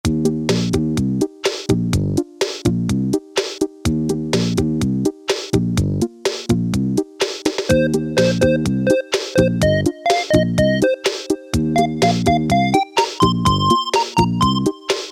ROCK 2, Casio Rapman rap patterns

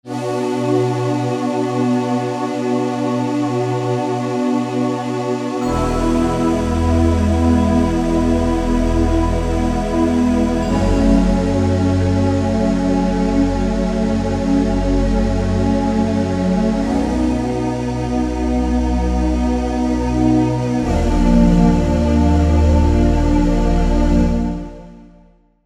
Air Chorus Bell

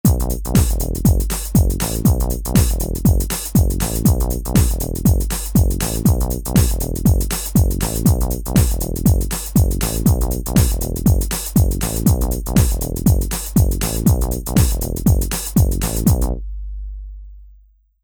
House

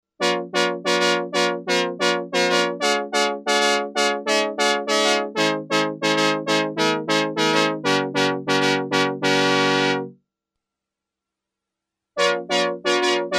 CHORUS

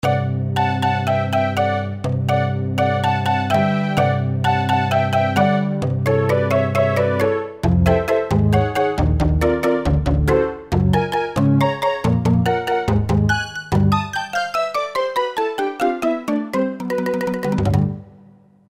Perc Stab, Roland JD-990; Factory Patches

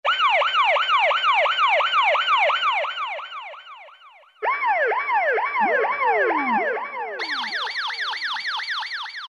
SIREN; Roland SN-U110-02

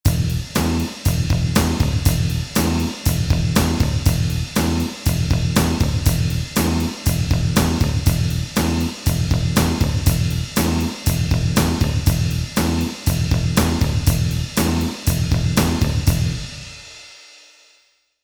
Metal

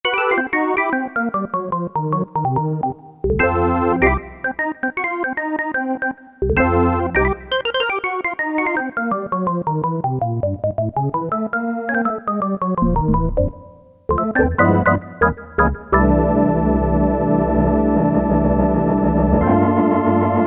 Perc Organ, Kurzweil K2000; Kurzweil K2000 V3

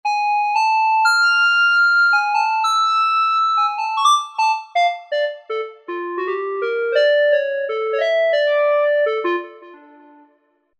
JP-8 Square, Roland V-Synth; Factory Patches

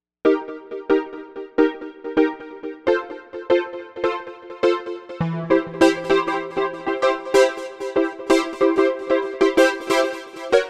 SynthPop Piano, Novation UltraNova; Factory Patches

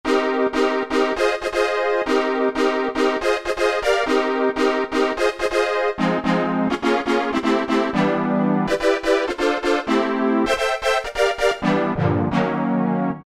Minor Rave, Roland SR-JV80-06 Dance

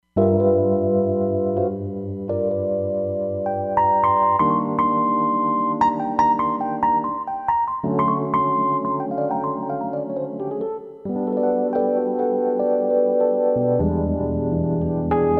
Crossed EP

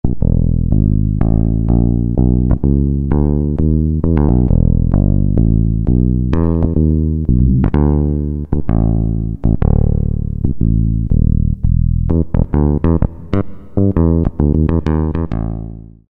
E Bass; Factory A

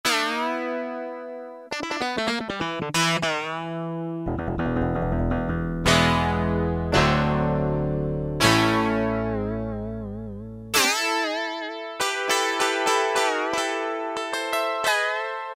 DOUBLE GUITAR, Casio VZ-10M; Internal factory combi

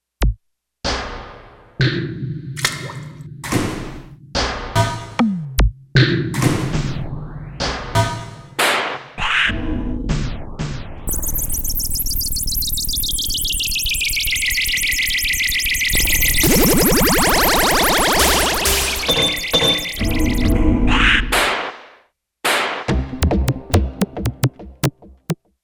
FX Menu

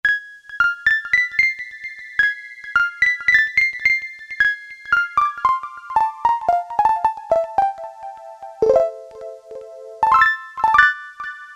TWINKLE ECHO, Casio Rapman; Tones

